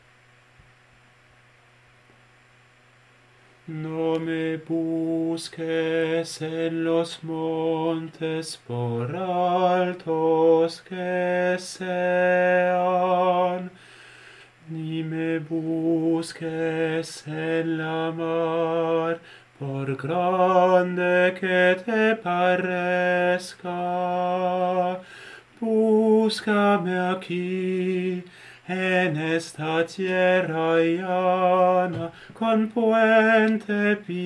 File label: alto; esta tierra